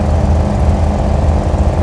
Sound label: Gelik High; car simulator 2